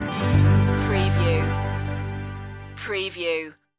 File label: summer in june stinger